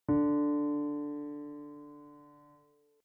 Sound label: bass note